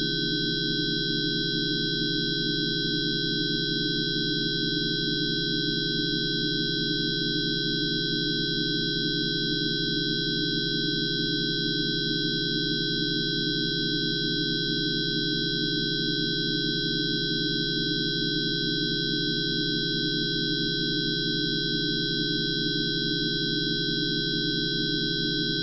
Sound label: Aluminium; atom tone